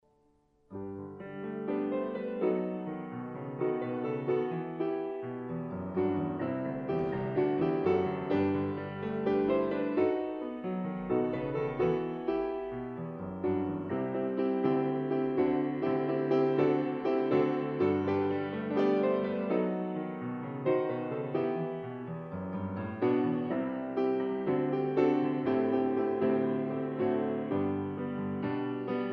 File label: Grand Piano